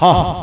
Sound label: LAUGH